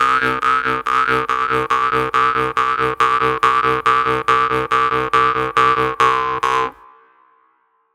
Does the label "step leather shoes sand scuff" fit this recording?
No